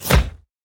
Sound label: halloween bone dragon bone rain